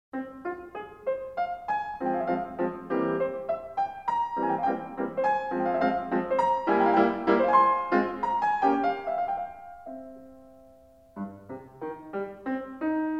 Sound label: Piano Sonata No. 1 in F minor; Wilhelm Kempff; Beethoven; Piano Sonatas